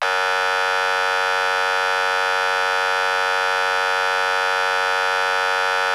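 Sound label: Federal Signal MS4000 Yelp, siren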